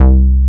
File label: MS-20, Synth Bass